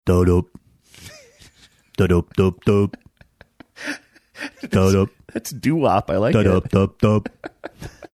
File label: mimicking only bassline of song saying its doo wop